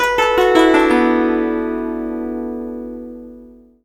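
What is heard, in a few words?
guzheng; Spectrasonics; Heart of Asia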